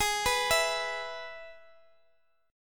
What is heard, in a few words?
G sharp Minor Sharp 5th, chord; x,20,19,19; 4G,5C,5E,5A